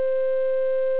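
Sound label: triangle wave; analog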